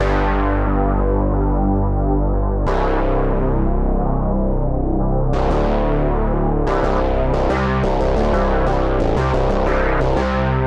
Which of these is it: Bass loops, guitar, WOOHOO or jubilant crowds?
Bass loops